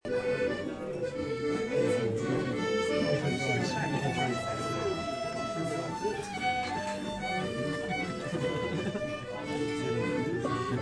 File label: folk jam; eel s foot